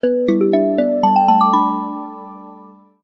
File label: sms; WAO